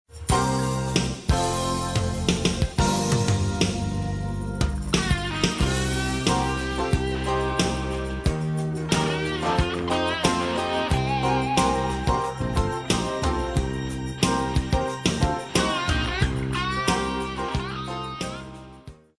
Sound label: blues